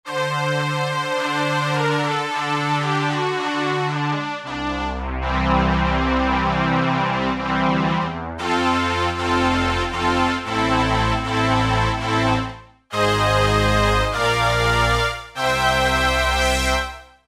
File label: Orchestrator, vintage synth; Roland SR-JV80-04